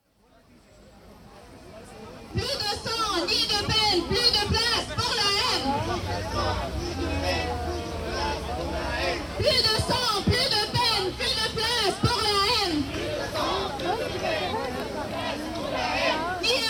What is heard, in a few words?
syria protest